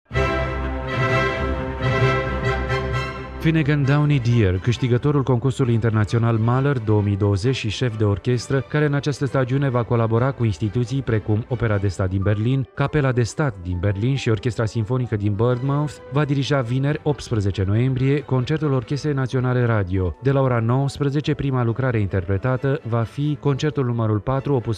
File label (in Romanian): Concertul nr 4 op 58 in Sol major pentru pian si orchestra